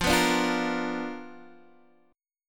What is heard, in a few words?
B Minor Major 7th Flat 5th, chord